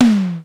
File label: SIMM MD, drum, tom